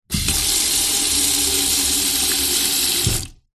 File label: household utility room sink fill plastic bucket with water short sequence